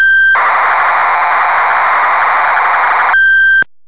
Korg Polysix factory presets bank b, Cassette Interface; Synthesizer Factory Patches